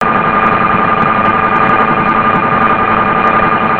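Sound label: interference on a radio station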